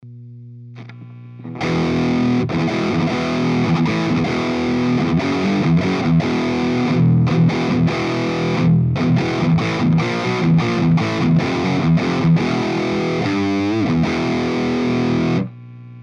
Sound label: XXL Cab